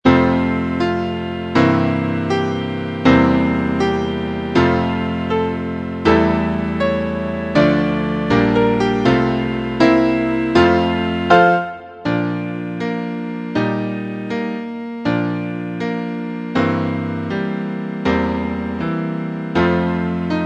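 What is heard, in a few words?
accompaniments, Trumpet in Bb; Vocalise #7; concone; Rudimentary Transposition Studies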